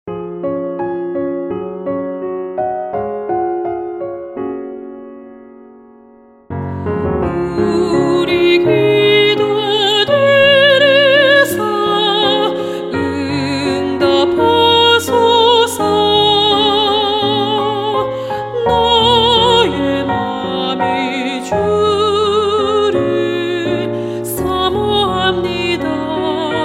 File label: SOPRANO